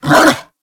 Kubrow Tame Bark

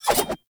Idle Swing Double, Weapons; Warframe; Tenno; Revenant; Nikana

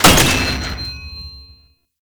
Tank Cannon Mech; Enemies; Weapons; Lasrian Tank; Warframe

